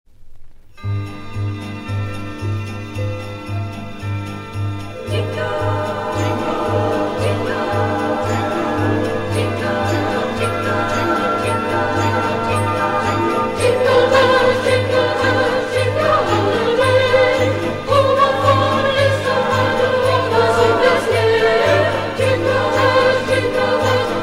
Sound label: The Bells of Christmas